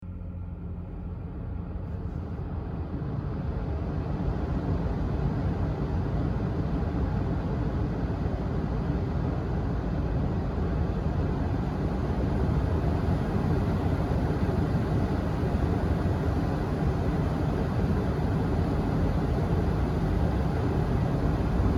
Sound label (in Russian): zvuk pechki mashyny